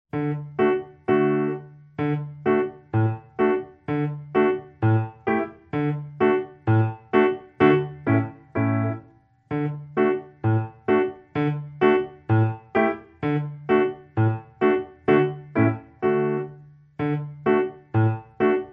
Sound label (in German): irisches fiddle lied mitspielversion